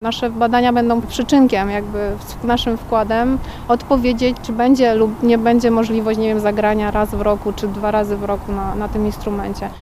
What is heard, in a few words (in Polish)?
fortepian; Ostatni fortepian Fryderyka Chopina